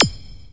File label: Tjup digi, Kraftwerkesque; Hip Hop; STYLE KITS (Hip Hop, Etc)